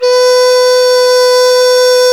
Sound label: QU DI B4 MF, QI-DI FLUTE; Spectrasonics; Heart of Asia